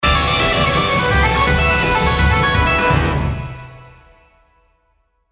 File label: you won; Highland Reels